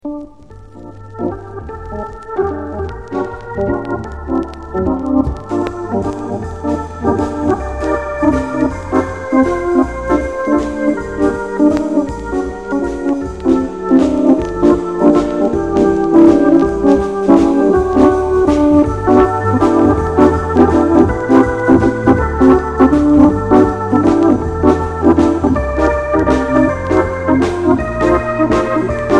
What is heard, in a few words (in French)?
Valse